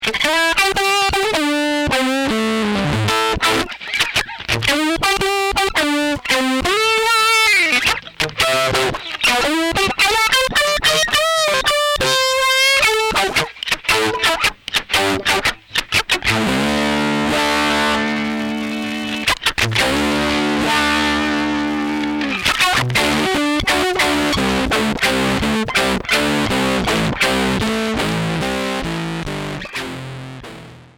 Rock groove Tube Zipper

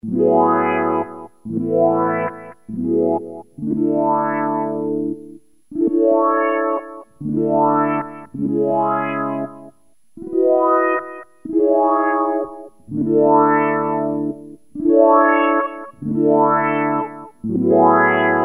moog

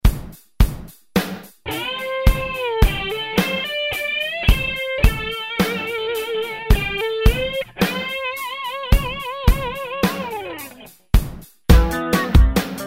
chorus

